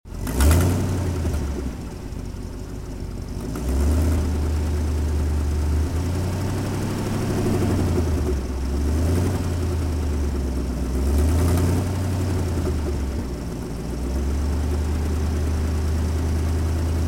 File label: Truck Under